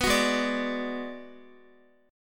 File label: Ab Minor 7th Flat 5th Ab, Guitar; strummed; x,x,9,11,8,9; down; 4E,4A,5D,5G,5C,6F